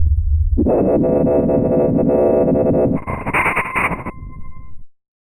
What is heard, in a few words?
glitch transmission